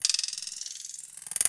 LOOP, 160 BPM; Ueberschall; Drum & Bass Flash Trackz

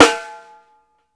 wood; Sampleheads; New York City Drumworks VOL-1

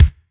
Kick natural; Sonic Foundry (Sony Creative Software); Ugly Remnants VOL-2; Beatstuff

